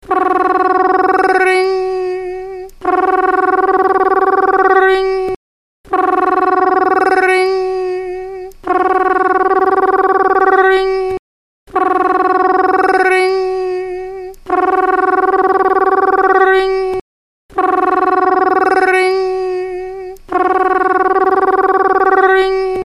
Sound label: Human Ringer